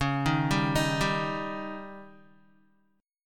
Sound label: C sharp Suspended 2nd Flat 5th, Guitar; arpeggiated; JGuitar